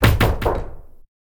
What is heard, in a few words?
Knock; MiSide